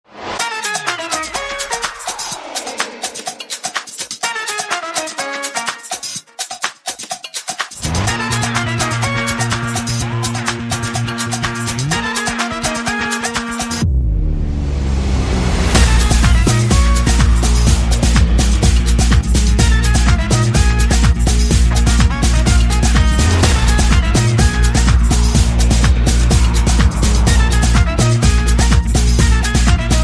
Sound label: No Vocals